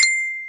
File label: pop ding